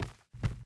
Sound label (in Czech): pult; Mafie 1